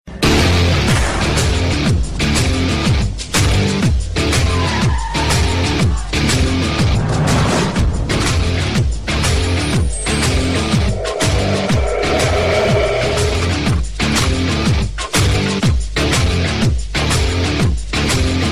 rock

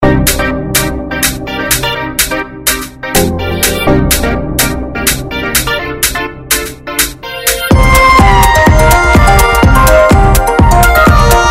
melody beats

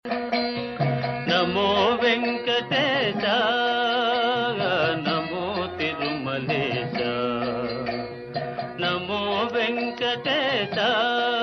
devotional

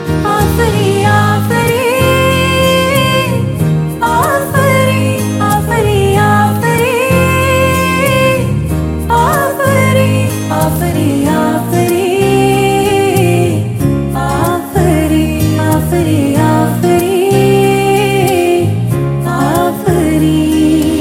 Chant

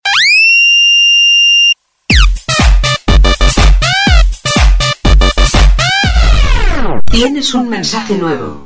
techno sms

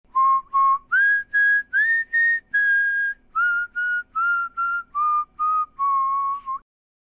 twinkle twinkle little star whistle

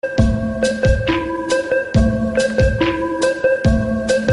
notification tone

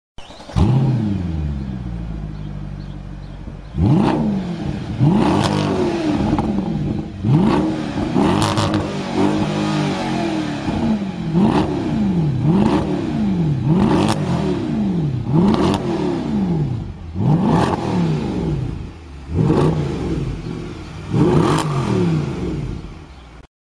mercedes e63 amg

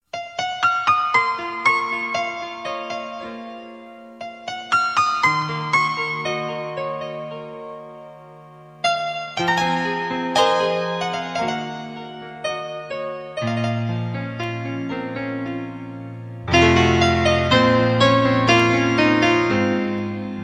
nice piano music

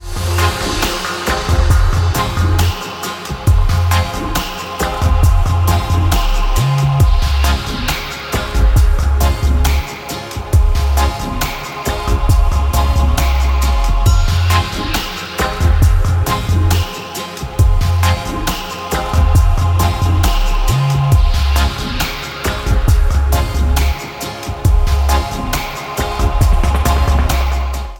fuzzy vibes

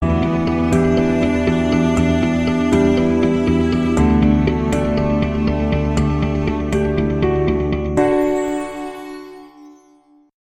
instrumental music

